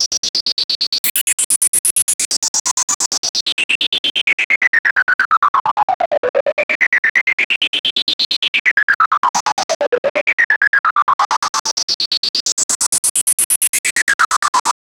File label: neurofunk reese